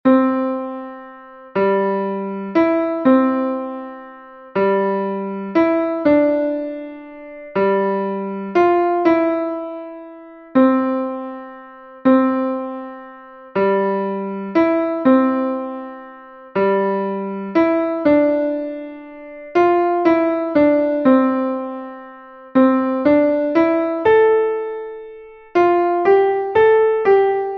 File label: Valse piano